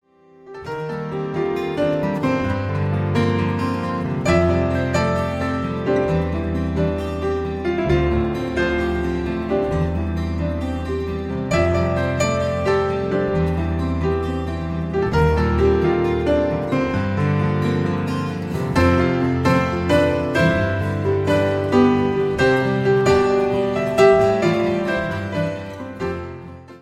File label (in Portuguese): Trilha